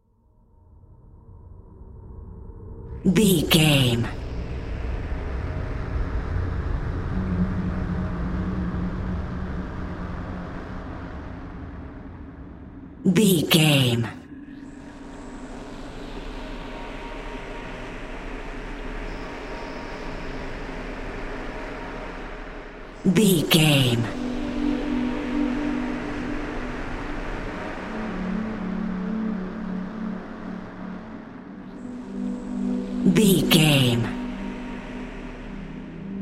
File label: alone and sad narrative